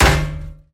metal bullet hit

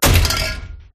vending break

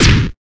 weapon wand burst shot explosion

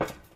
footstep metal

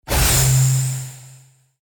item light flare skill

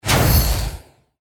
weapon wand fan sparks

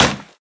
wood bullet hit

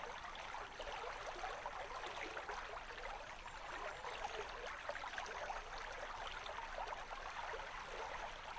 ambient stream

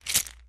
pills pickup

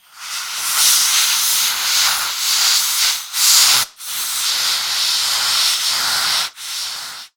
explosion anti fire

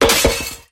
toilet break

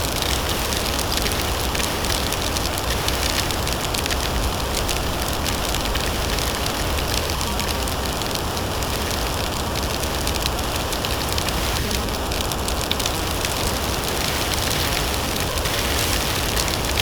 player ignited loop

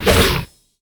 monster orc attack

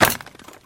crate break

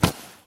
heavy pickup

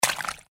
player walk water loop; footsteps

